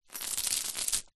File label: industrial chain medium drop to ground; zapsplat